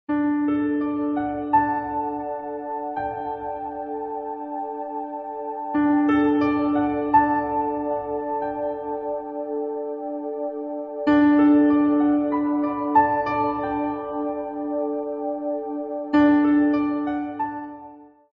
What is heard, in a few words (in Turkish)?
santral fon muzikleri